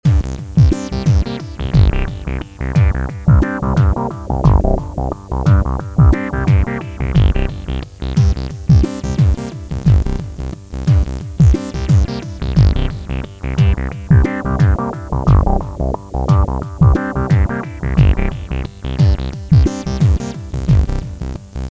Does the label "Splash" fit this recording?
Yes